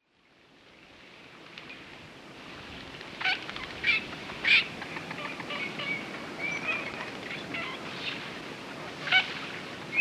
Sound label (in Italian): Alzavola